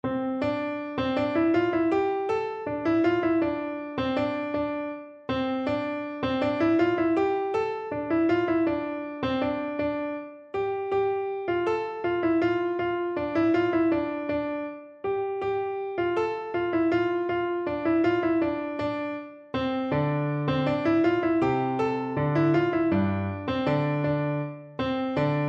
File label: ozi, piano